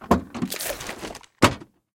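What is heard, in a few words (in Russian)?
хранение документов в ящике стола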